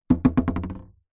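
leisure ball rubber gentle bounces fast on hollow wood surface; zapsplat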